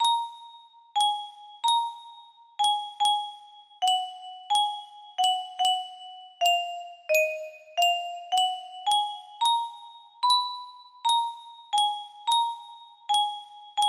lullaby waltz, music box melody

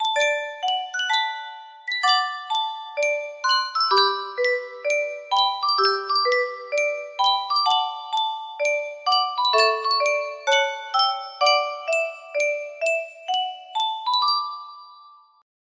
yunsheng custom tune music box, music box melody

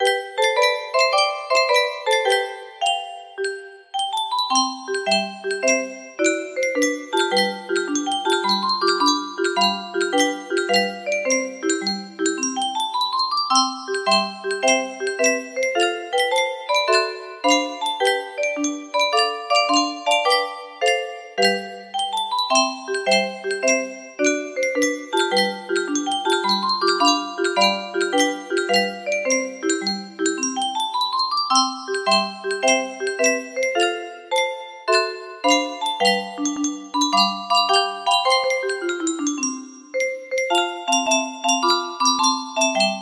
full version for 30 note music box

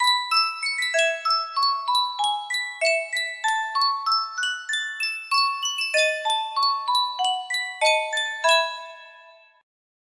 sankyo miniature music box, music box melody

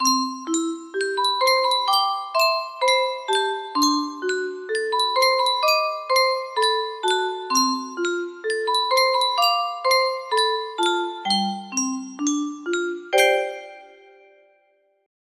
yunsheng custom tune music box, music box melody

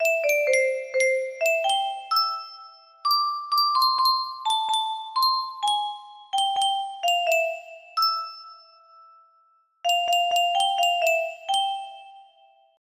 Yunsheng custom tune music box, music box melody

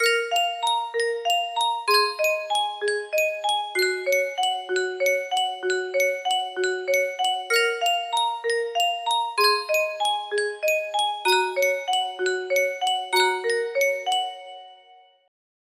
Yunsheng, melody

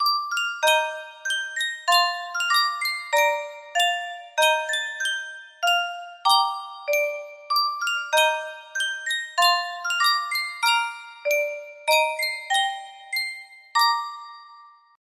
yunsheng custom tune music box, music box melody

